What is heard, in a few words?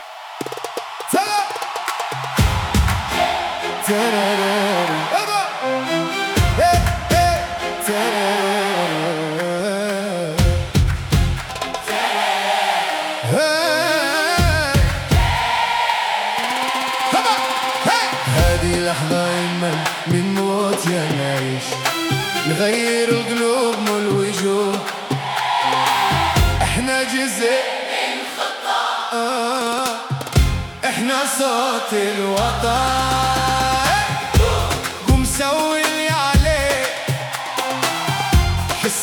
Arabic Pop, Bahrain, LiveVoc, choir